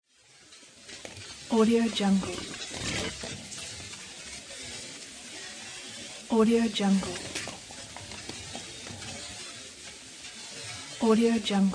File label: pigs eating